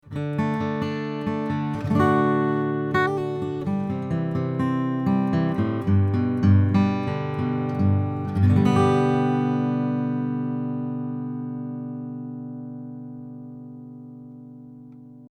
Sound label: Cardioid Picking A